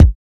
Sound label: 00s short reverb bass drum sample d sharp key, bass drum one shot tuned to the D# note